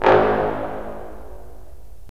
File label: BRS, Roland LA Composer